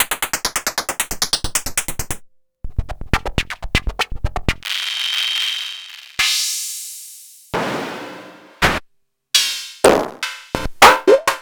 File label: Hat c